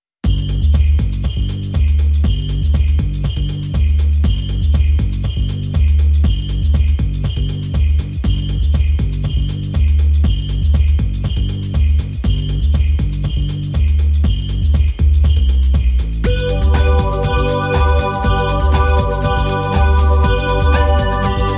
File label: jingle bells slow version instrumental